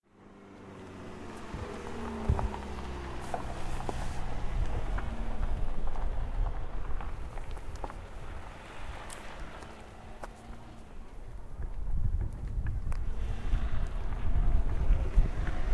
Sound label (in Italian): acqua foglie traffico vento; Pietramurata; Niccolo; ascolto invento Dro